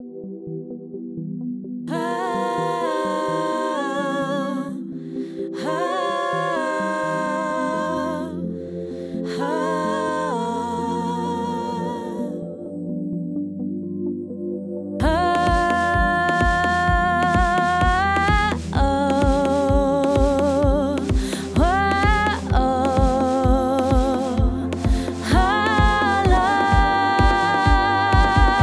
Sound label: Dance Acapellas